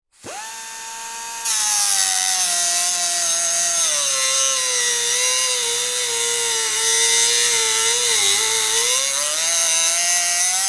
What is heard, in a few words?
Die Grinder Grind, Cut; Machinery Sound Effects; Machines